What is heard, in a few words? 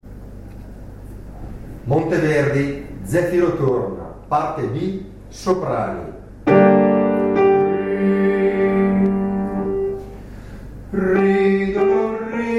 soprani; Zefiro torna B